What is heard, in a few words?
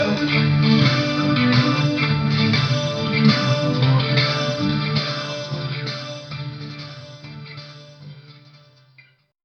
room tone